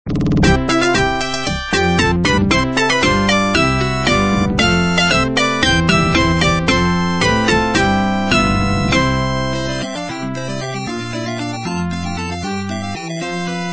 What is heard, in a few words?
Stage Clear; Kamiya Studio